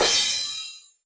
Cymbals crash; Vengeance Samples

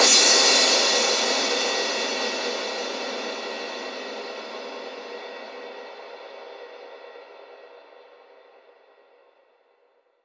Crash, Cymbals; Vengeance Samples; VEH3 Cymbals

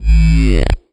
Synth Bass; Vengeance Samples; VEE Synth Shots

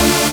Synths D; Vengeance Samples

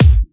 Hard Kicks; Vengeance Samples

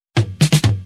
Fill Ins 128BPM; Vengeance Samples; VEH2 Loops

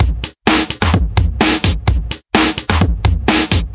House Beat, 128 BPM

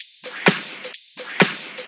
Electro Loop 128 BPM; Vengeance Samples

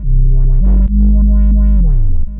Roland B Line, Roland S100; musicradar-synth-samples

